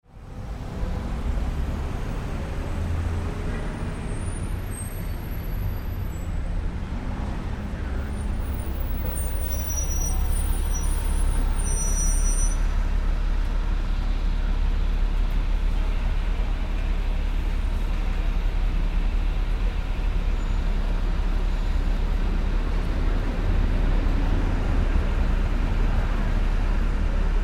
Traffic Background: Smooth, even city traffic ambience; Smooth, even city traffic ambience. Buses,cars, horns, slight crowd walla.; Lax; Traffic And Car Sound Effects